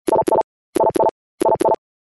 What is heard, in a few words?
Short Sms